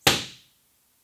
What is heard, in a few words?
IR